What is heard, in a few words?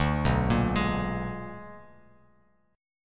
B-Minor Major 13th, Bass Standard; BmM13; arpeggiated; JGuitar